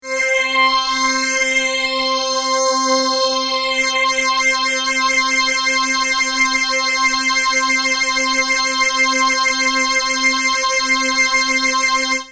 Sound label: maestro ps1